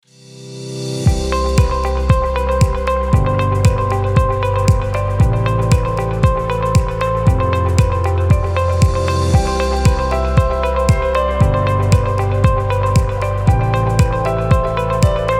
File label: intro and outro music bed; Magnolia; Magnolia Regional Health